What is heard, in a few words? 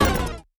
Weapon Fail